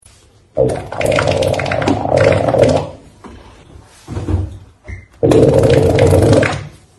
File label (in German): Quetschfurz